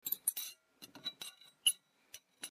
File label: dinner